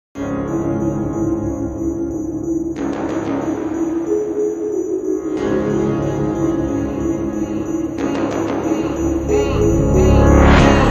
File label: super slowed reverb